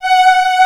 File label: ST G4, ANA STRINGS, SYNTHESIZER; AKAI S6000 CD-ROM - Volume 1